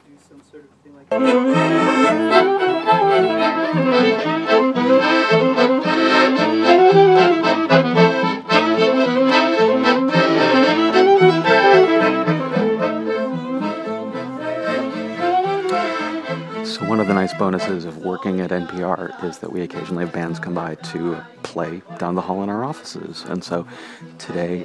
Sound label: sound check